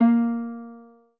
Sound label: spanish guitar